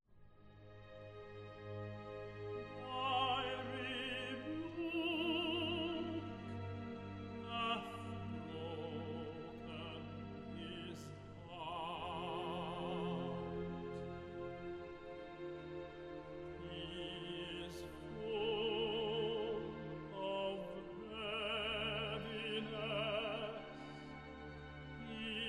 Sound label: Toronto Symphony Orchestra; Messiah - Handel